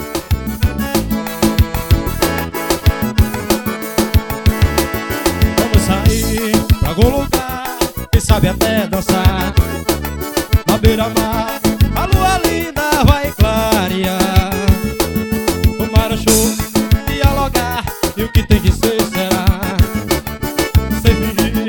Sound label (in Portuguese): forro arretado